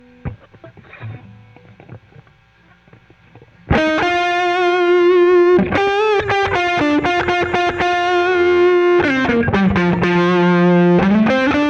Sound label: Solo Gtr